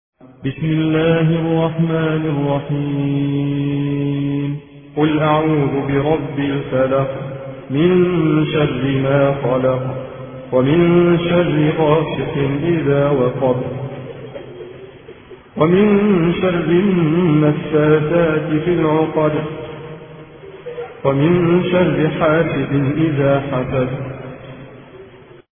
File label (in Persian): ترتیل روایت حفص عن عاصم; سوره فلق